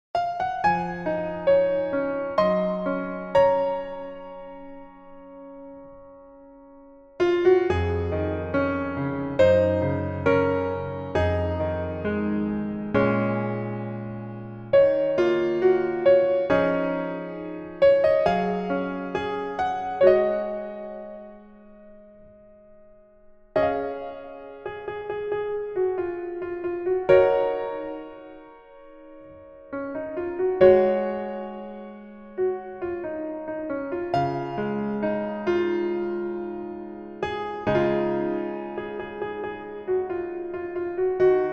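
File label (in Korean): Piano version 멜로디 MR -1키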